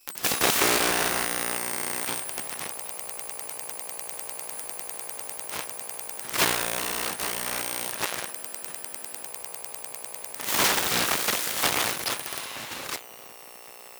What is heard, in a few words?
Electromagnetic Ipad Sequence; Mono; Essentials Series NOX SOUND